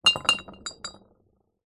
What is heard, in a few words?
foley bottles glass beer empty x4 movement bash on concrete surface; zapsplat